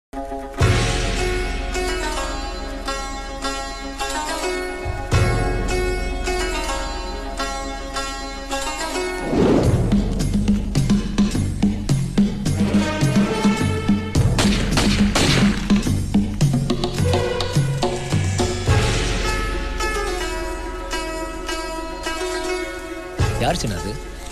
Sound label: bheema bgm tamil bgm; tamil ringtone